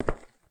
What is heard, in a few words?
footstep